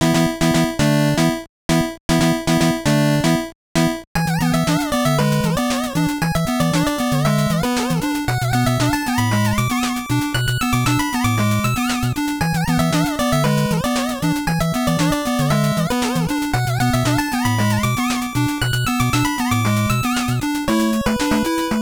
Cave

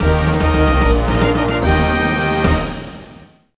3rd Place Trophy Jingle; Nicktoons Racing

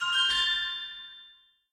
Stinger Item; Golf Story

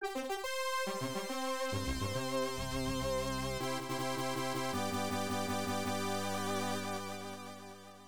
Harvest Festival Fanfare; Princess Maker 2; FM Towns

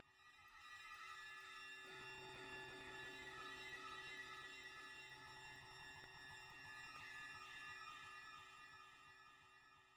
Background Noise

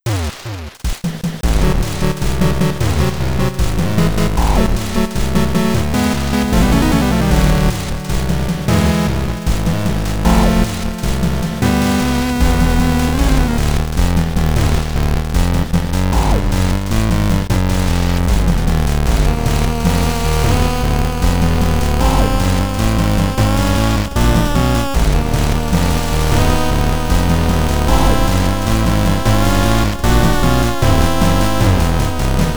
Chiptune

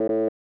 Unused Jingle; bs gekkan coin toss deck; snes

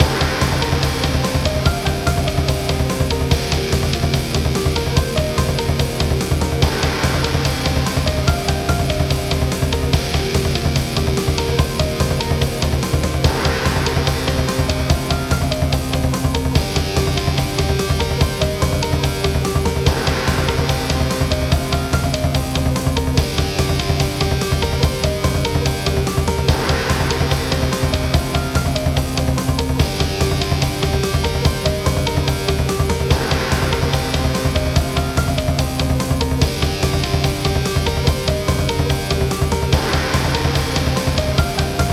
Samurai Music; Super Samurai Rampage android ios mobile windows gamerip 2017; Level 3

